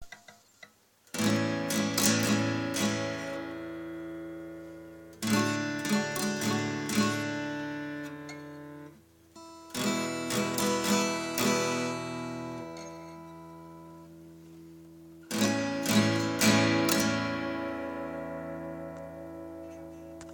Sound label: guitar softly a d e7